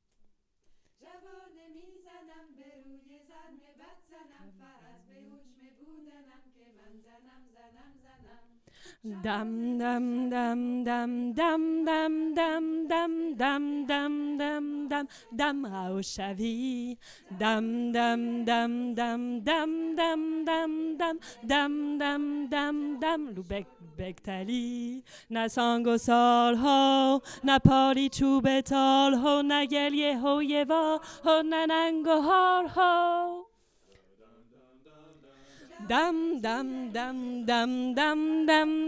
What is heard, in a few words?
tenor; transmission par la Canaille du Midi